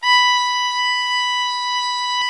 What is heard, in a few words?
STR XVIOLA, Str Quartet; Viola&Violn